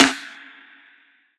snare; dough-samples; eddyflux

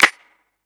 clap; eddyflux; dough-samples

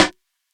snare; dough-samples; eddyflux

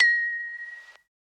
bell; eddyflux

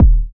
bd; drumkit; switchangel

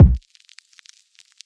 kick; eddyflux; crate bd; dough-samples

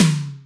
Elec T, BossDR660 mt; drum machine

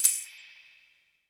tamb; eddyflux

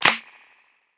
clap; eddyflux; dough-samples

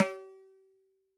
dhin, mrid

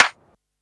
snap; eddyflux; dough-samples

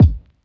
kick; eddyflux; crate bd; dough-samples

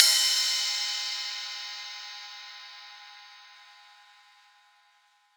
crash; eddyflux

